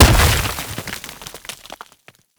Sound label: Scan Pillar Plant Debris; Warframe; Frumentarius; Scan Ability